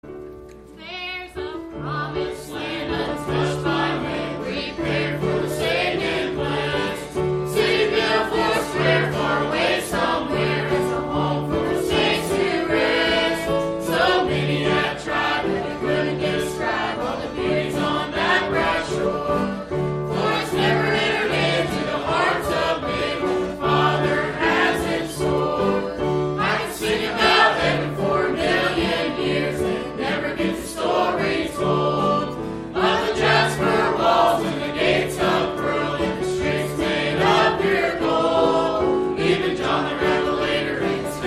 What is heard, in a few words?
Youth Choir; I Could Sing About Heaven